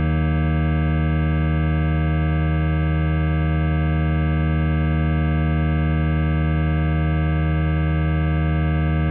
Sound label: ebm chord, Omnichord; OmnichordGodot